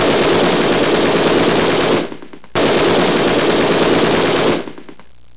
fire; netpanzer

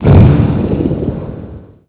explode; glaxium

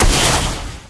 plasma hit; blobAndConquer; combat

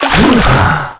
Gasp; scourge

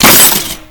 glass hit; blobAndConquer; combat

